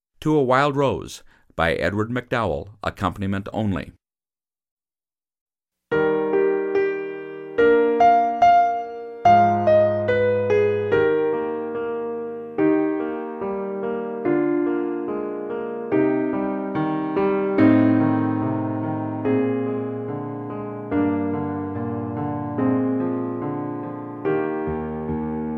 Accomp only; To A Wild Rose

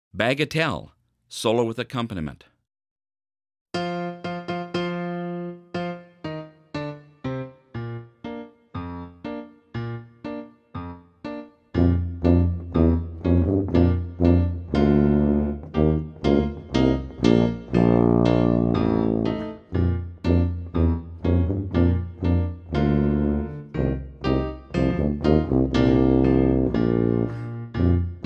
Bagatelle Solo With Accompaniment, Band

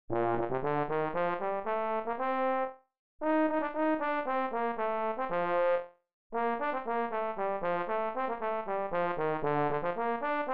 Baritone

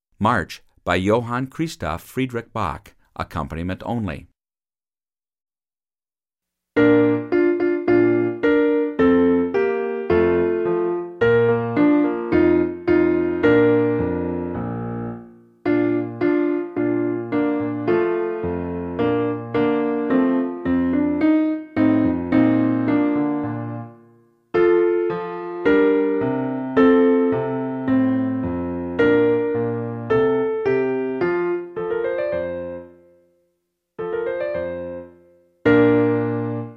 March Accomp only; Piano Only – Performance Tempo

